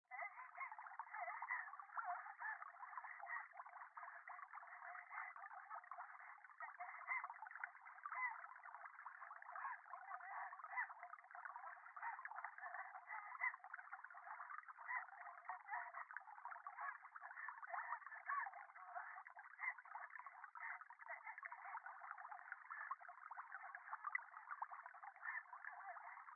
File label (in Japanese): 電話 喘ぎ 川のせせらぎ